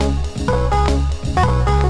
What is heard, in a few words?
LOOP, Techno Trance; Music Loops